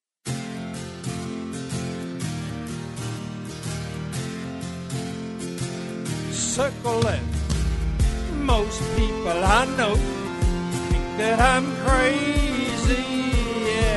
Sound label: vocal